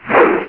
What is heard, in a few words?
Warrior Blade Swing; Playable Tutorial Level; TR2